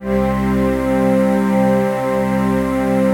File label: chord pad; Best Service; XXL 800 Pads; Sample CDs